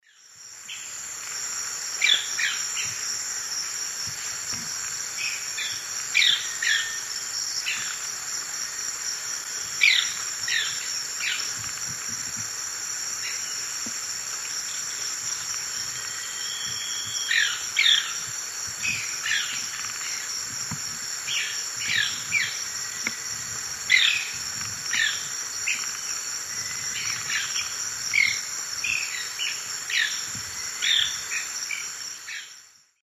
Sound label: Manacus manacus, calls; S. America; Eastern Lowlands